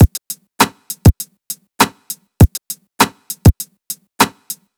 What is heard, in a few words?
FK BEAT, FUNK; USB Soundscan vol.47; AKAI; Hip-Hop & R&B